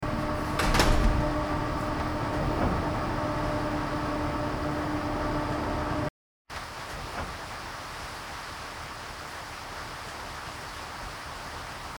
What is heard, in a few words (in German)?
Wasserpumpe gefiltert